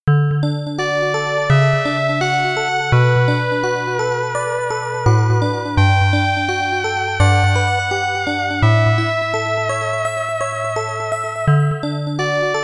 EPIANO; Sekai de Ichiban Kimi ga Suki; X68000

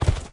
Footstep; lethal company; ambience; windows

